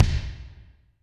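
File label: Kick Ballad, Alesis D4; Kik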